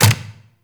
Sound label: Turret Dry Fire; Lasrian APC